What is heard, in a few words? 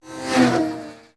high speed car zips by